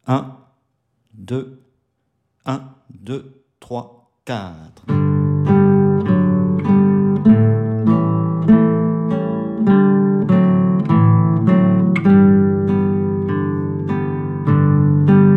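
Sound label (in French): 100bpm, guitare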